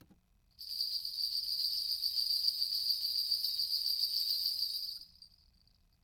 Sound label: sleighbells